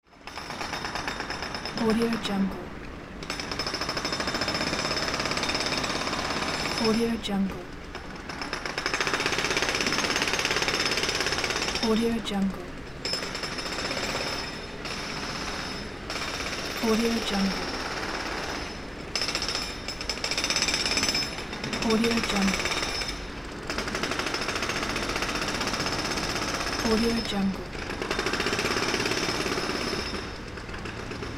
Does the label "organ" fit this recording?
No